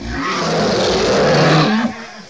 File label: roar; duke nukem total meltdown